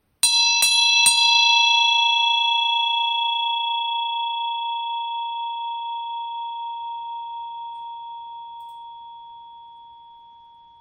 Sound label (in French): cloche